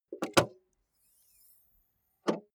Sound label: car bmw e46 exterior bonnet open long